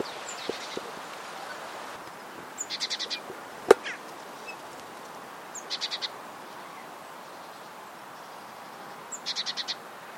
mesange charbonniere

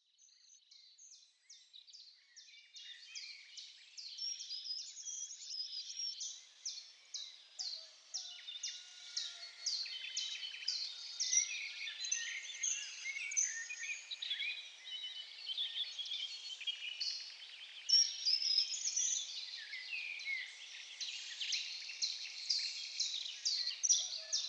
concert oiseaux spiruline d entrecaste

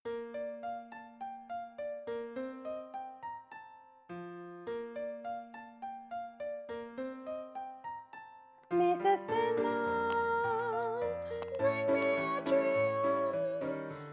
chant tenor pno

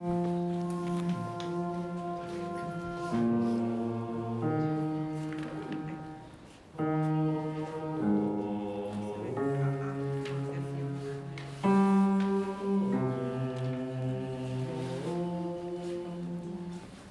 basse

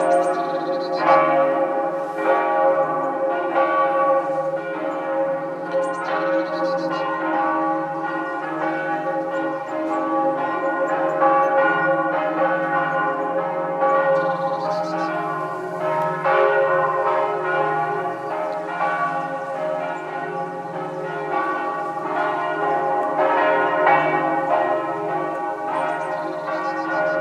cloches

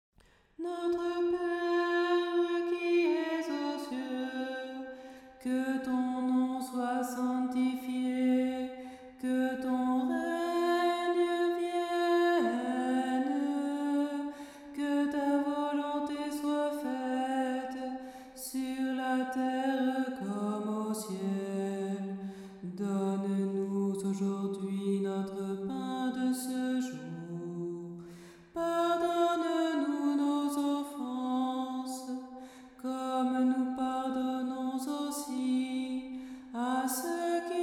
voix 4; notre pere rimsky korsakov

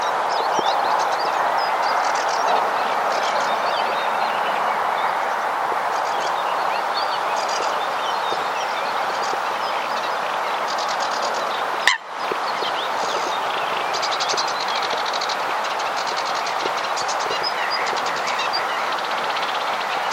phragmite joncs étang

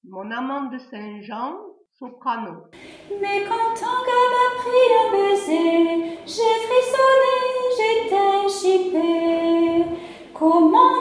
soprano

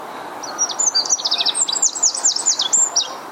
troglodyte mignon

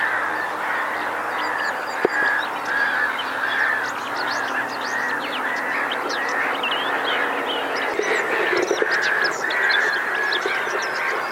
fauvette tete noire etang

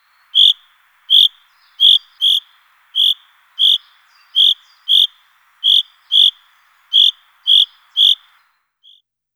pinson des arbres cris

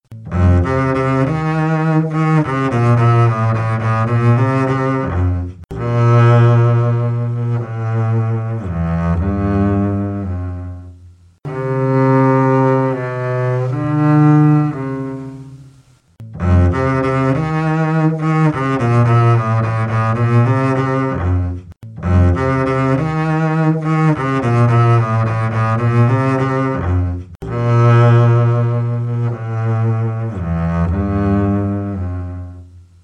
contrebasse

